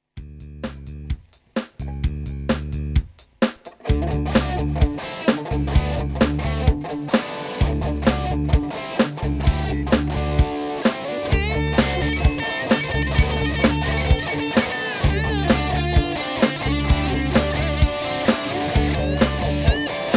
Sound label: rock